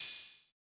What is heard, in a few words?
Open Hat